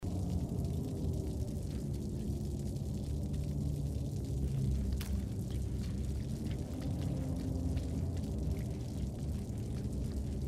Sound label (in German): wasser; Little Nightmares; gamesoundopedia